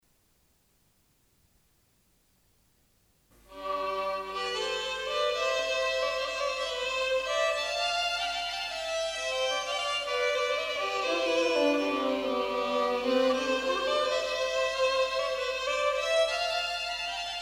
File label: Polska